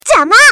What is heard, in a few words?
grenade; csgo